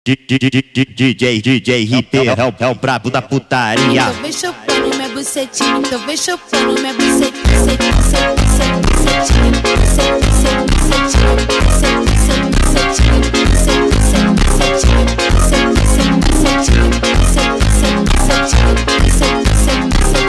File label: Phonk MONTAGEM